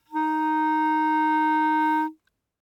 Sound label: Bb Clarinet ff Eb4